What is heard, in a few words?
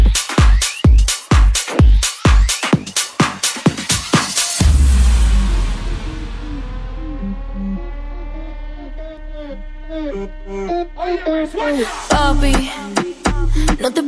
Bass house